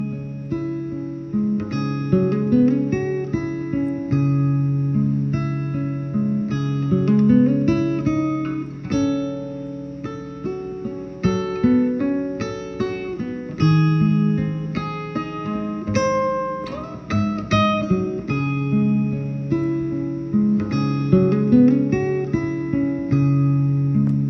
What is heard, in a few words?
brown electric guitar